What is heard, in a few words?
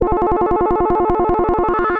Effects; Worm